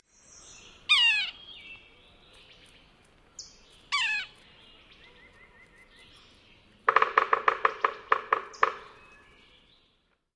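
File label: mews and drum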